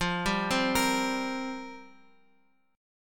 F Suspended 2nd Suspended 4th, down, Guitar, Open F; Fsus2sus4; arpeggiated